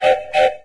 travel beep; windows 9x; sound schemes

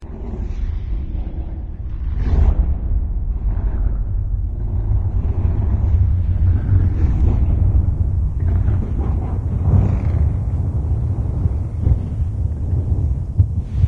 citadel outside fx; source engine; episodic

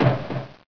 g bounce, weapons; source engine; hl1

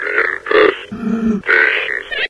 zombine alert; source engine; episodic

